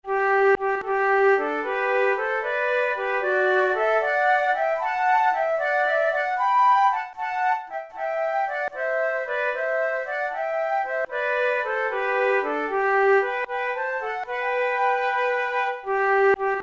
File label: duet, flute